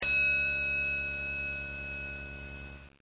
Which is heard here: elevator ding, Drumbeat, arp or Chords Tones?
elevator ding